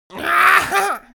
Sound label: creature imp laugh